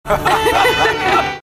laughing

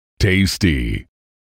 candy crush tasty sound effect

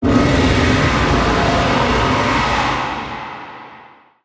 roar distant; rathalos; rathian

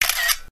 iphone click

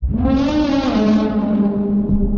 short bass; miguel ohara

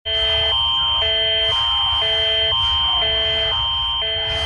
canada eas warning sound

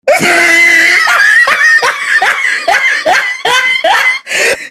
long laugh

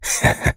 hehe

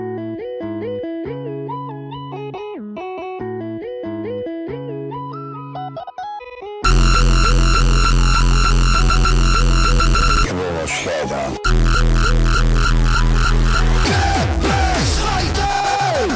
music is emotion

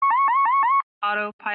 autopilot alarm

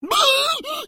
pig fear; bad piggies ross

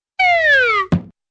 descending whistle and thump

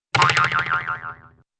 wooden boing

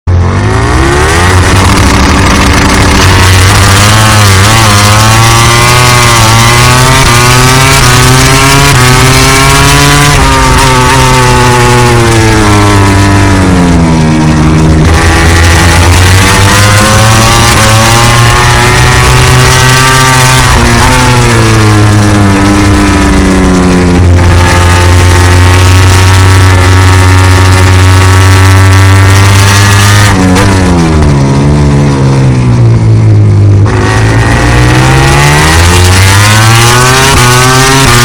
motogp loud

